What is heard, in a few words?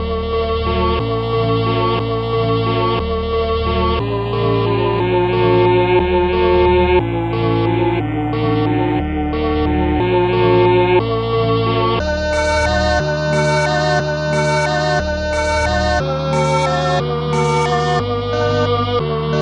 Spanish Romance